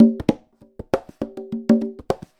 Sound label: Conga Loop (100BPM); musicradar